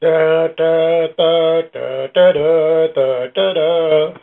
da da da, male singing

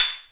Korg KPR-77

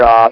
off, VIDEO GAMES; wow

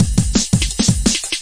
170 bpm bright cymbal jungle

